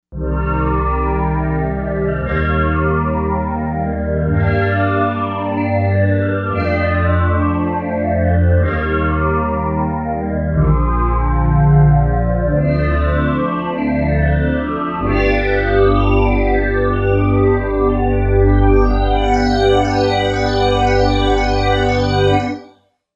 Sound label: Wavesweep, Korg M1Rex; Factory Patches